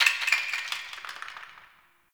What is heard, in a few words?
Brick Crumble; Ambience; Warframe; Nineteen Ninety Nine